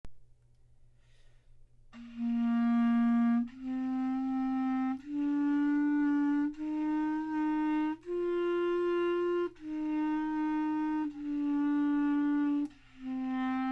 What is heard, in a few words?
clarinet